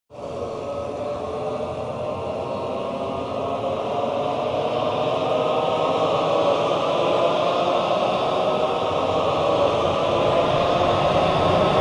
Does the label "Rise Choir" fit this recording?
Yes